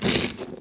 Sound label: foot snow, footsteps; midnight guns